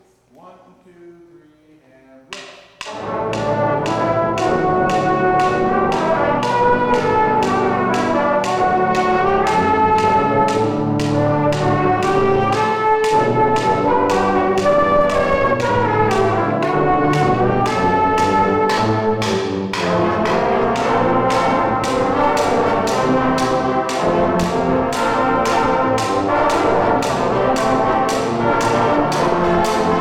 brass practice; woburn ma

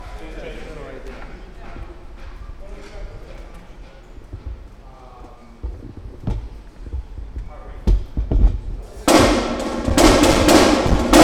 Drum Intro

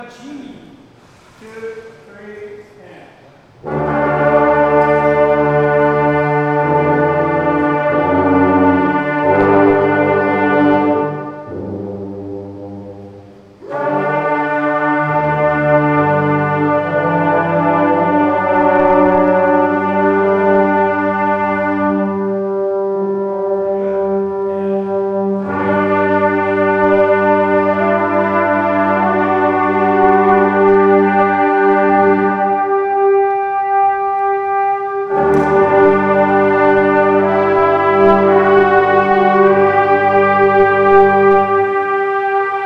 Warm Up Run, brass

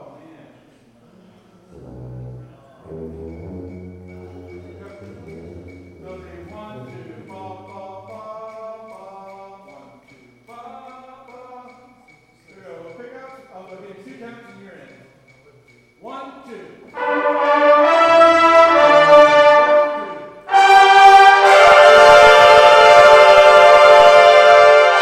Happy Birthday, brass practice; woburn ma

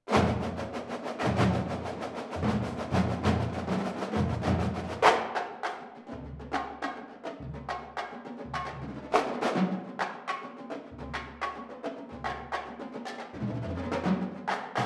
Drum Solo; north star full ensemble

